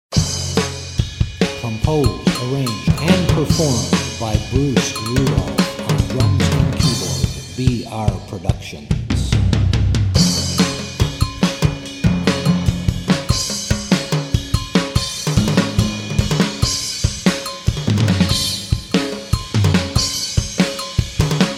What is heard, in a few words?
drums bass chase follow scene